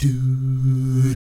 DOO, Vocal Planet Jazz

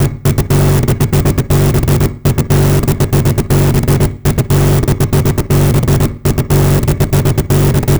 Envelope Beat; Drums & Drones

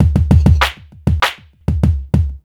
beat, roots funk; USB Soundscan vol.41 - Roots Funk; AKAI

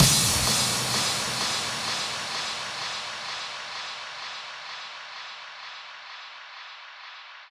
Drum Loop FX Downlifter, 128BPM; VEC5 Drumloops; VEC5 Multi Loops 128BPM; Loop Elements

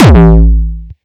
Attack Kick

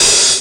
hat open high noise, Chicago House Drum Hits

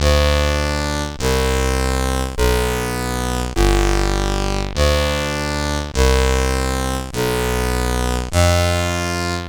C64 Bass, Commodore 64, Chiptune

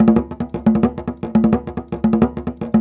176 GHANA, African Ethnic Drums; Spectrasonics; Supreme Beats

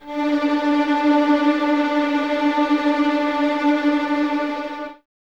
Violins Tremolo D 4; Miroslav Vitous; 11 Violins

